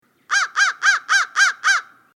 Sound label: crow caw; Cornell